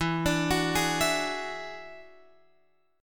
E 9th E down Guitar Open F; E9 arpeggiated; JGuitar

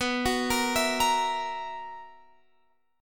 B Major 7th Suspended 4th B down Guitar Open F; arpeggiated

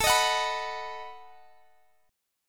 B Major A

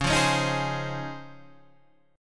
C sharp Major 9th, Guitar, Half Step Down; C#M9

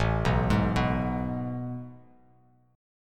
A Major 7th Suspended 2nd, Bass Standard; AM7sus2 arpeggiated; JGuitar

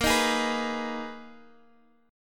Ab Minor Bb, Abm/Bb chord; Abm/Bb strummed; JGuitar

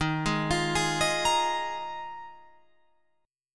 Eb Suspended 2nd Suspended 4th, Guitar, Open F; Ebsus2sus4; arpeggiated

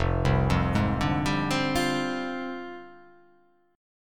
F Minor 6th Add 9th, Fm6add9 Chord; JGuitar

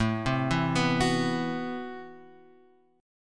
A Minor 6th Add 9th A, Chord; Am6add9 Chord {5 3 2 4 X 2} arpeggiated

